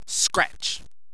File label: scratch